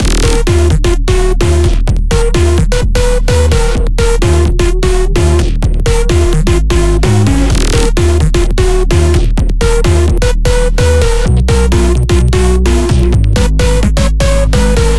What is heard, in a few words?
128 BPM D# Min, House, Drop Loops; Cymatics